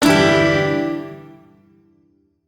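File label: Shawzin Major Chord; Warframe; Duviri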